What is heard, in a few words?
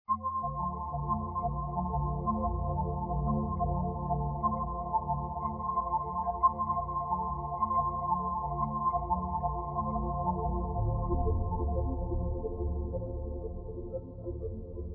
ambience